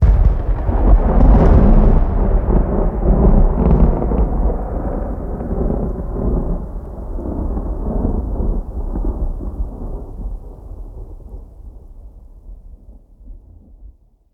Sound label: Distant Strike